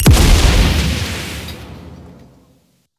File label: Mine Explosion